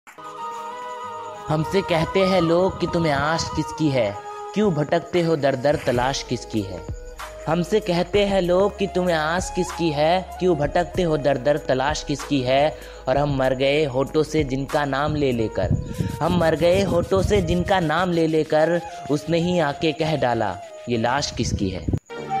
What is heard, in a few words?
Bengali Ringtone